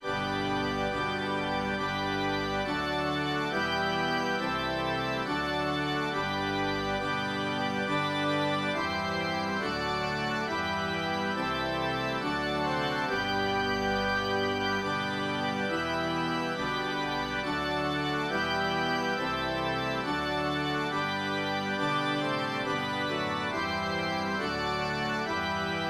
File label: organ